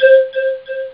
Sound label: Call